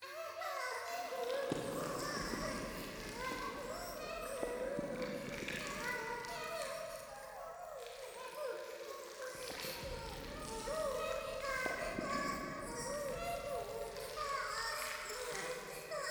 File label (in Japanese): 可愛い喘ぎ 触手